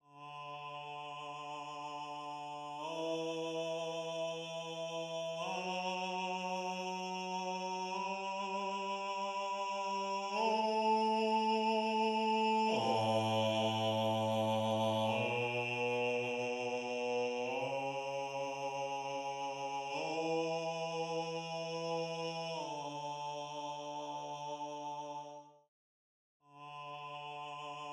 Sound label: Bass, counterpoint